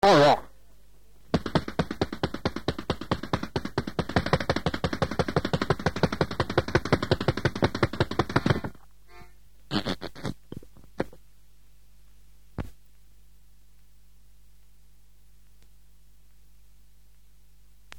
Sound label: instrumental; thrash avantgarde